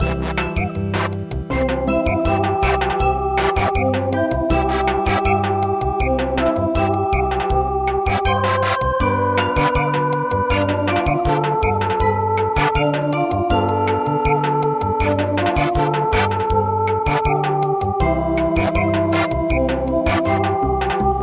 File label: Merry Christmas Samba